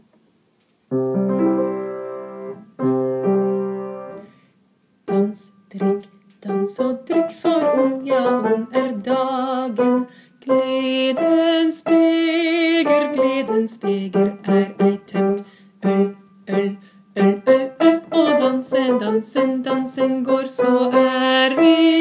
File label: TENOR